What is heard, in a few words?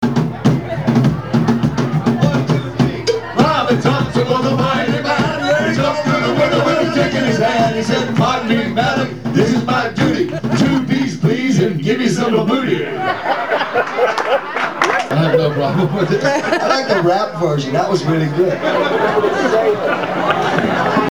rap